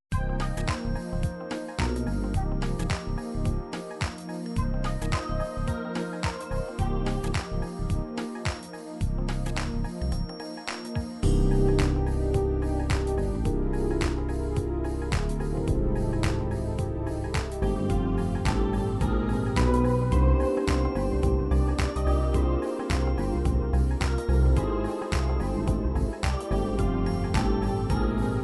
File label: dance hiphop